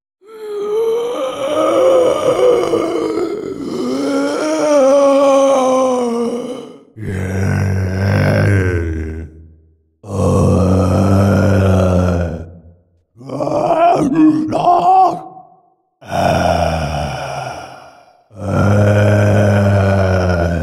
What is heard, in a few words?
Zombie sounds